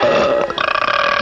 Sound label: burp